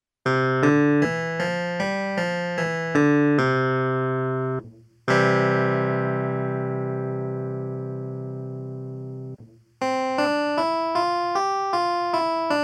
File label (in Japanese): Clavi 音色